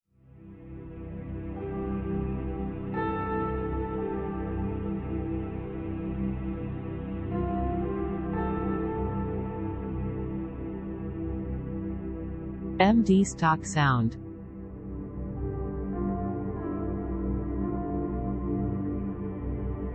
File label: Birds Above No Nature Fx